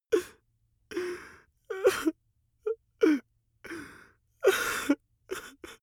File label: male crying; soundpool